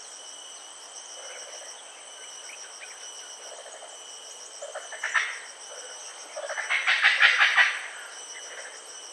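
Bintan Island Pale-thighed Langur, This is the typical alarm call of a number of Presbytis species and subspecies.; Presbytis siamensis rhionis; Bintan Island, Riau Ar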